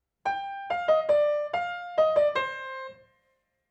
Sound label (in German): flieg ich von hier flute davon